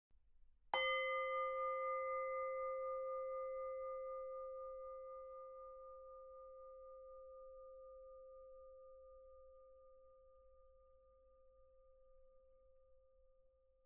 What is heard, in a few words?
medium medium, aluphone